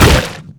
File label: gun fire, weapons; tf2classic